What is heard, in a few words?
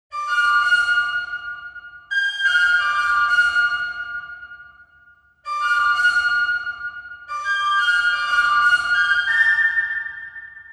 african boy flute